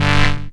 SYN CHD; E-Lab; X Static Goldmine 2; akai

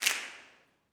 SNAPS, Gospel

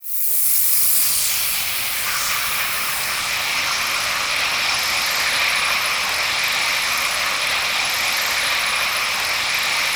ATMOPAD; Best Service; XX Large; Pads

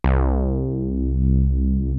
SYN BASS; ZERO-G; Datafile

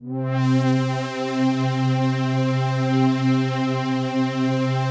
PAD, Pads; Best Service; XX Large

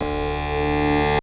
buzz grow

